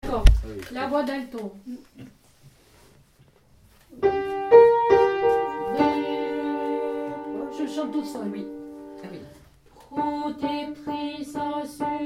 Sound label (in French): Protectrice des chretiens alto